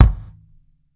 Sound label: REG, KICK DRUM; Sampleheads; New York City Drumworks VOL-1